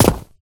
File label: footstep concrete land; Poppy Playtime; ProSoundCollection v1 3